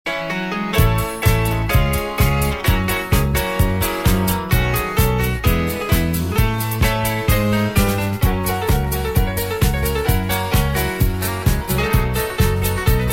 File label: harmony